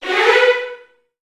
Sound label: VN FX, Virtuoso Strings